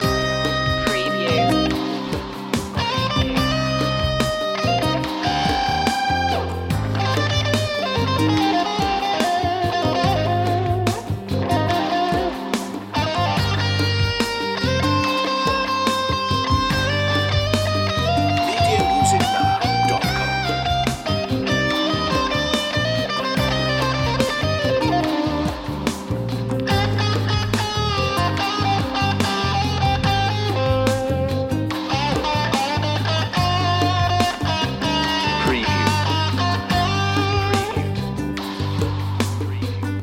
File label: mediterranean guitar loop